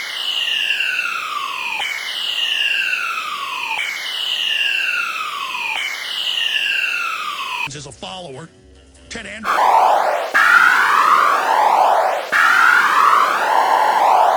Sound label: formant